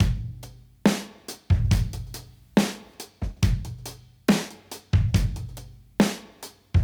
Modern Breaks; Touch Loops; ClassicBreakSessions

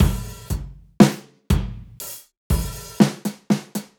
Drumroom

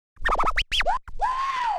OLDSCHOOL SCRATCH 96

